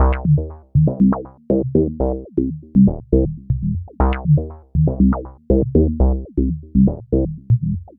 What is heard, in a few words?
Bassline, Prototechno